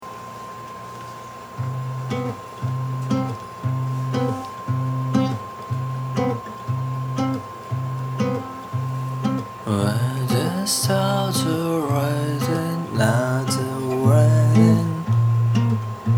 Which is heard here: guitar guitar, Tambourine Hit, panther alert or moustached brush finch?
guitar guitar